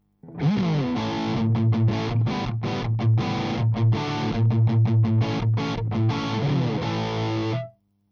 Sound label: rhythm; KSM313 front